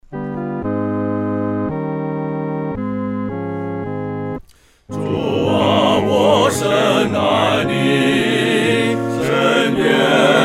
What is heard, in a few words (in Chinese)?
合唱 四声部; 主啊！我深爱袮